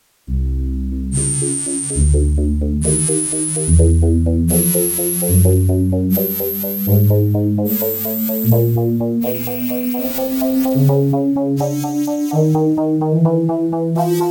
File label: K5M Problem Sample